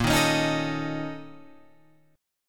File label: A sharp Minor Major 7th Double Flat 5th, A sharp, down, Guitar, Open F; A#mM7bb5 strummed; JGuitar